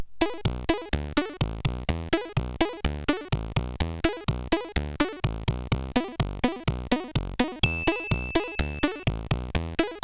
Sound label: sid CSG8580R5